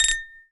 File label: tableware